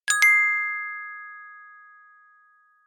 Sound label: order alert